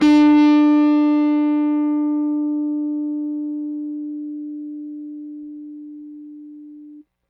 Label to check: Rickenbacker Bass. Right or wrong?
Right